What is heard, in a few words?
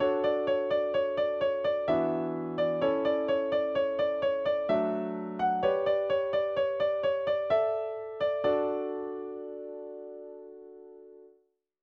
instrumental